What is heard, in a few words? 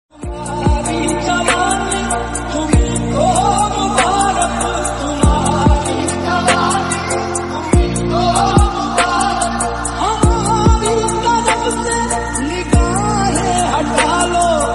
hindi qawwali